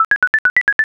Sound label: processing